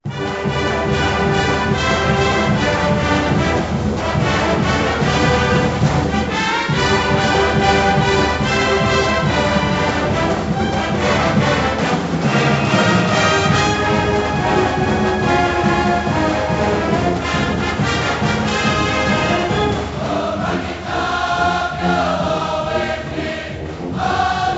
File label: Fight Tune; NCAA March Madness 99; PS1